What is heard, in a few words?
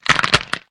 bone